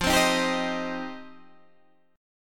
F 7th Suspended 2nd F Guitar Open D; 15,15,13,13,15,13

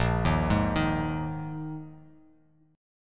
A sharp 7th Suspended 4th down; arpeggiated; JGuitar

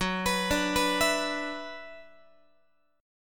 F sharp 7th Suspended 4th Sharp 5th, Guitar; F#7sus4#5 arpeggiated

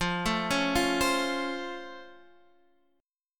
C sharp Augmented Major 7th F, guitar chord

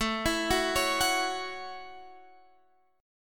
A 6th, Guitar, Open F; A6 arpeggiated; x,16,16,13,16,13

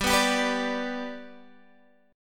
G Major, Guitar, Standard; G strummed

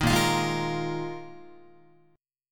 A sharp 7th Suspended 4th, Guitar; A#7sus4; strummed

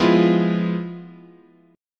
F Minor Major 7th Flat 5th, chord

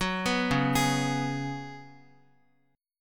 Db 7th Suspended 4th Db down Guitar; arpeggiated

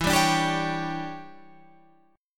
E Minor 13th guitar chord, Open F; Em13 strummed; JGuitar

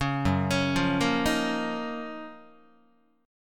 Gb Major 13th Gb down Guitar; GbM13 arpeggiated; JGuitar

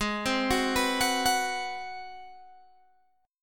Ab 7th Sharp 9th Guitar Open G, chord

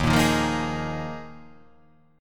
D sharp Suspended 2nd Suspended 4th D sharp

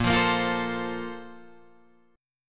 Bb Major 7th Suspended 2nd, Guitar, Drop D

